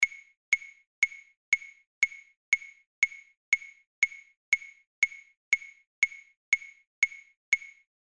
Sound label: Click